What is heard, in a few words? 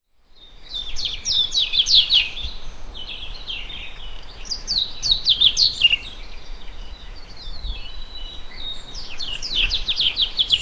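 birds trees animals grass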